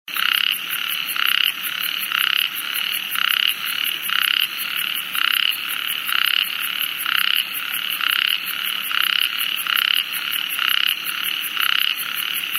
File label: ornate narrow-mouthed toad